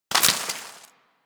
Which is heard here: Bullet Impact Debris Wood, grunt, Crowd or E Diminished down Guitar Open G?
Bullet Impact Debris Wood